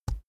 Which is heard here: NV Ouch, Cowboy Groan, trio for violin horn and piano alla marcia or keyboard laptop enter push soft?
keyboard laptop enter push soft